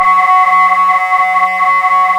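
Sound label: synth Techno bell; Best Service Gigapack 1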